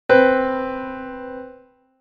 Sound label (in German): Intervalle simultan